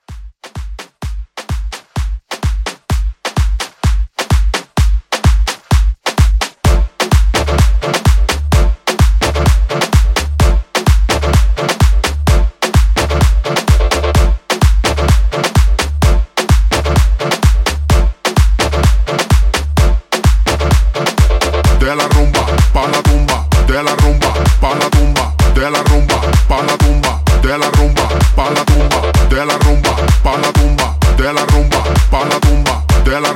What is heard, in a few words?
Latin Club